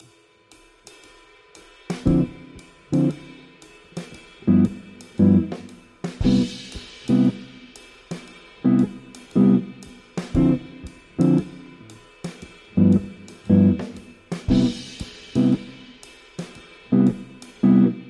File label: audio jazz; ritmos de jazz